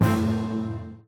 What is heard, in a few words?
Player Air Dash; Crown